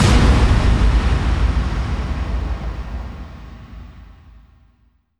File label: bassdrums FX, FX kicks; Vengeance - Essential Clubsounds Vol.2